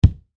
Impact Hit Solid; Punch And Hit Sound Effects; Punches and Hits (Sound Effects:Combat); SFX Bible 6

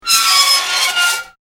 Metal Screech Intense High Pitch; Nasty metal grind screech, ear splitting screeching and clanking; Metal Sound Effects

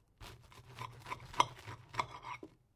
TOAST CUT; Various food preparation; Food (Sound Effects:Household)

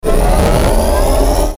Ascend Short Static Fuzz Power Up; Blastwave

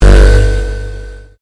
Convolution Pulse; Sound elements to create more complex sound effects; Swell Sound Effects; Sound Design

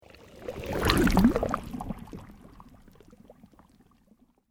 Sweep Organic Slow

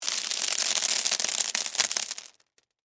Crash Stones Debris, Crash, Stones, Debris, Spill; Rocks; Materials; BlastWave

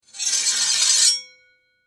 Sword Scrape Blade Heavy; Warfare; Combat

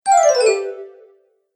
Magical Message Notification; Musical

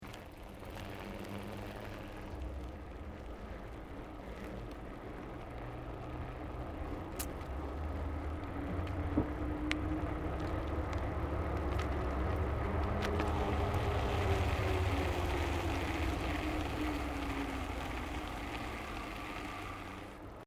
Bicycle Mountain Bike Road Freewheeling Downhill; Bike near Tazawako Japan; Bicycles; Vehicles

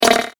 Platform Game Whack Sound; Video Game Sound Effects; electronic sound effect

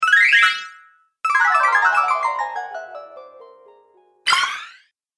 Various Game Sounds, video game sound effects

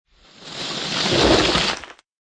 Skiing Snowboarding Coming In And Stopping

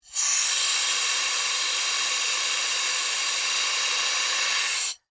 Pneumatic Release, Scifi; Air, Valve, Science, Fiction; Spacecraft; BlastWave

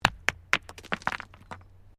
STONES MOVE; Material components for constructing more complex sounds; Rocks; Materials

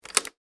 Foley Deadbolt Unlock Door; Locks; Objects; SFX Bible

